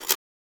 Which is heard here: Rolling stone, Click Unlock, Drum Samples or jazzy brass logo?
Click Unlock